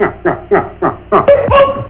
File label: nyuk nyuk, ringtones; Curly